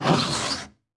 store tap; mapple

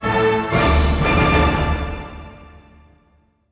reward win; superheroes2

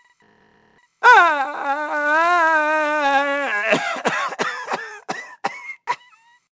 man getting hit by football

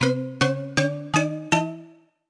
crafting menu; ea tsto

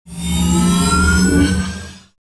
statue build up; ea tsto; radioactive man

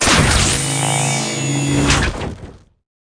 xray machine; superheroes2

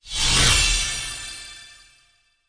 ui level up; sports

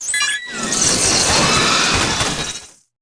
robot ant tap; monorail

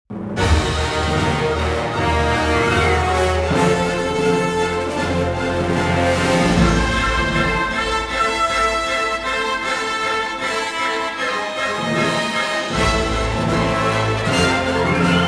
main music

